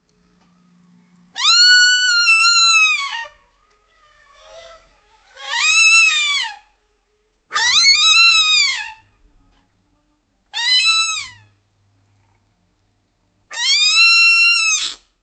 elephant sound

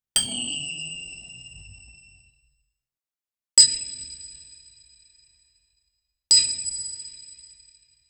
energy projectile ricochet, metallic ping

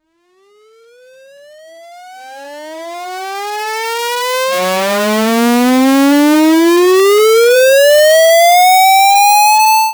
pixel acceleration revvin, chiptune

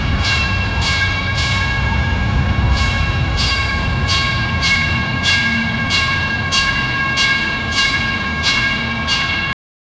horror metallic clang

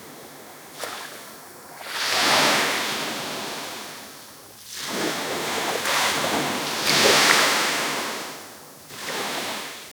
waves coming in, beach

